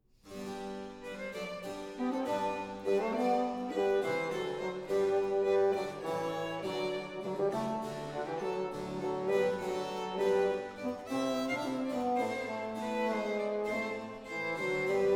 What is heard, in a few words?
dulcian; LAura Tarara Tarara; Antonius de Salazar